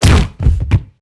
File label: Death falling; characters